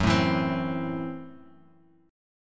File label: Gb Minor 7th Flat 5th, Bass Standard; strummed; JGuitar